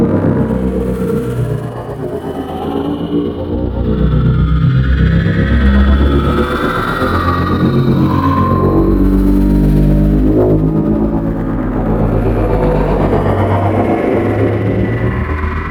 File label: Rotation Loop, Ship; Alternate Engines; Zekti